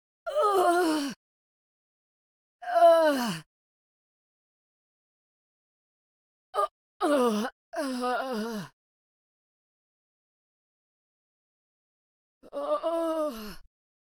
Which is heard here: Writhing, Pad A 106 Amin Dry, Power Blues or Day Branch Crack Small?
Writhing